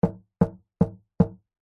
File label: wood hollow knocking hand; foley; zapsplat